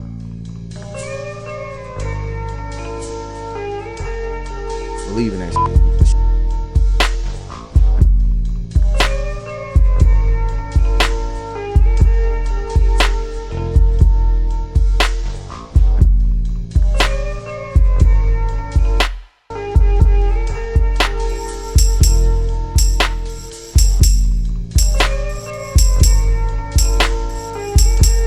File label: Wobble Bass